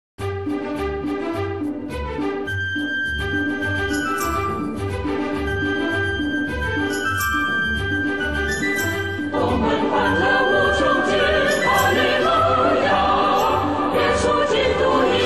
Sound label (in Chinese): 欢乐无穷尽歌, 赞美诗歌; 红本新编赞美诗